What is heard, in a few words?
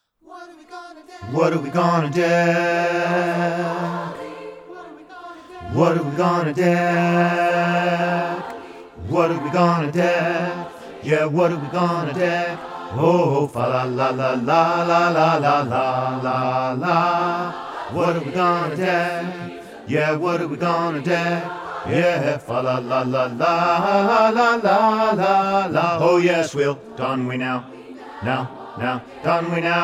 DECK THE HALLS BASS, learning tracks; PAP DECK THE HALLS